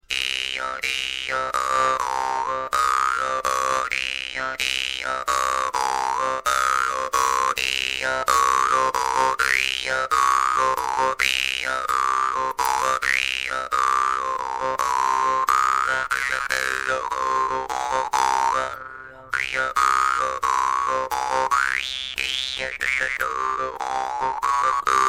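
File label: big, doromb; dorobm atila big; vargan; attila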